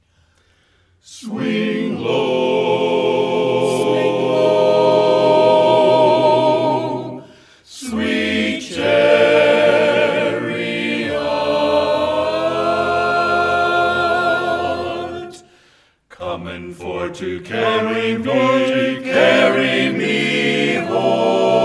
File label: Swing Low